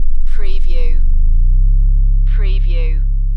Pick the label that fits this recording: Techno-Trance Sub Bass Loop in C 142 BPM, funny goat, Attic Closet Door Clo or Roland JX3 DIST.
Techno-Trance Sub Bass Loop in C 142 BPM